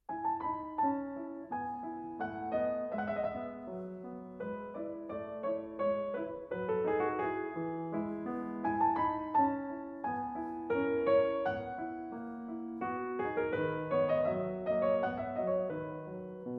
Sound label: French Suite in E, Menuet Polonaise; French Suite No.6 in E, BWV 817; Vladimir Ashkenazy; Bach French Suites, BWV 812-817